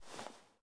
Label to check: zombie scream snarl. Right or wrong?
Wrong